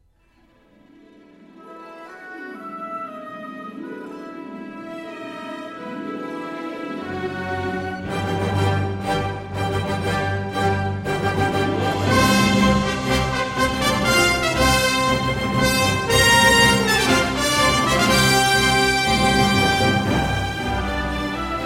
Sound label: Orchestral Finale